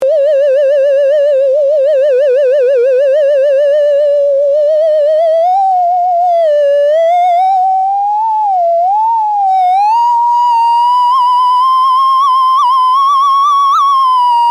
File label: OpenTheremin